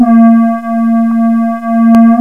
ENSONIQ MOOG

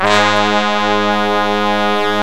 ENSONIQ BRASS SECTION

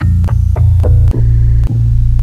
ENSONIQ DRAWBAR ORGAN

